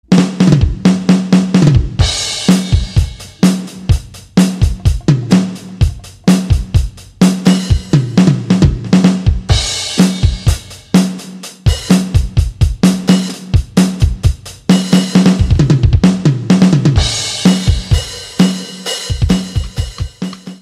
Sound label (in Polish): Electronic Ruskije Melodije